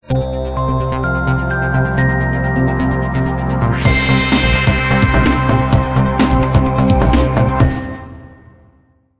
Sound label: stinger ident